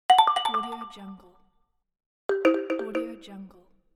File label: phone alert